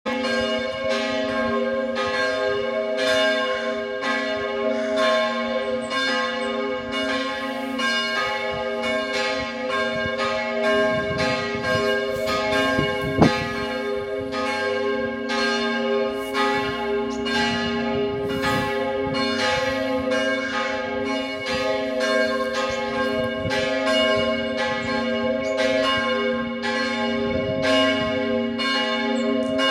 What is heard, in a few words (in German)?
Glocke; Griesheim